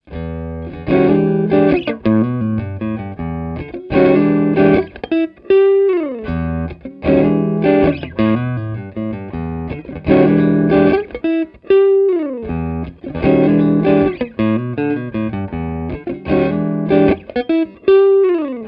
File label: KSM137 Stationary, amp samples